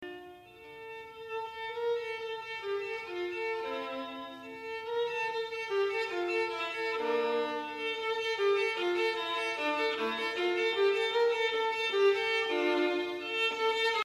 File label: violin